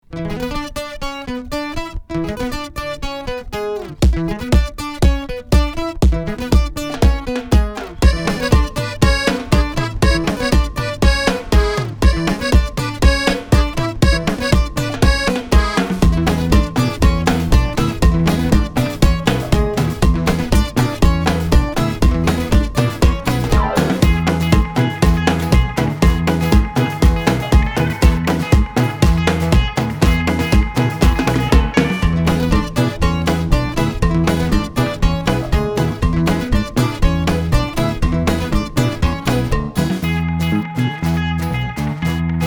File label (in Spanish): Cumbia Norte